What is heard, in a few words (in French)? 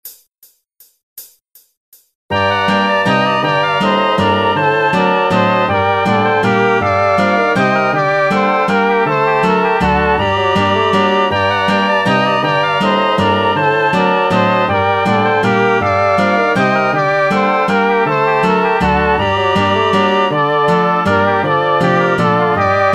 Bourree; Bourree Du Plaix